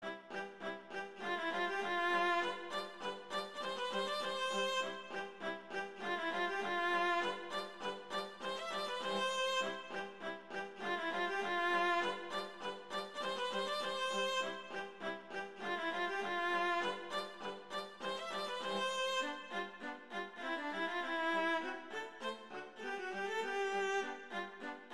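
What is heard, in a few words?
Duet, Allegretto, two cellos; Mazas; Duets; Duet 9; Danse Negre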